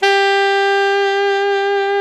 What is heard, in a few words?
sax alto mp; Alto 414